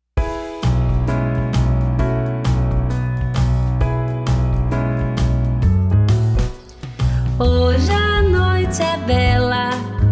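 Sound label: Jazzy Jingle Bells, bass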